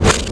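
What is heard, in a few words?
medic attack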